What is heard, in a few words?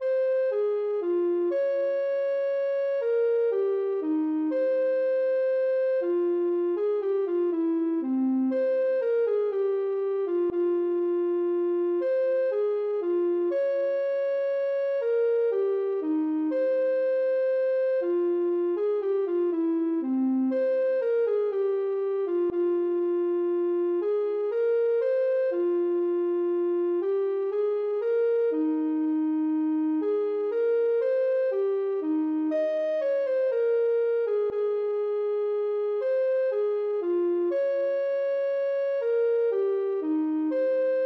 menuet, ocarina; krieger